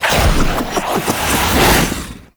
Ice Charge Attack; Warframe; Railjack; Grn Admirals

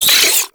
Low Clip; Weapons; Warframe; Tenno; Oraxia

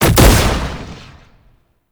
Brood Impact; Warframe; Oraxia

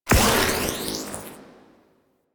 Corruption Pickup Hostile Small; Warframe; Missions; Zariman

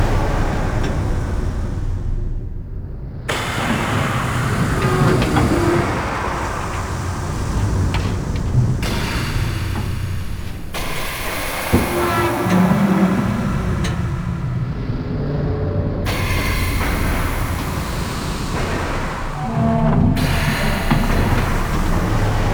Metal Pipe Loop; Ambience; Props; Warframe; Entrati

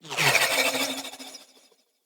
Decoy Arrow Whiz By; Powers; Fey; Saboteur

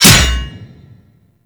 Axe Impact Default; Warframe

